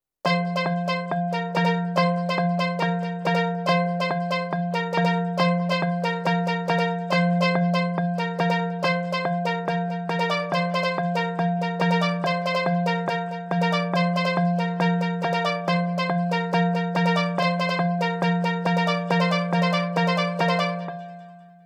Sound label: perc 140